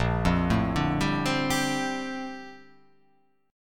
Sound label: A sharp Major 13th A sharp